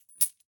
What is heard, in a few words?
Coins In Sack Held By Drawstring; Coins and Money; Shapeforms; The Mint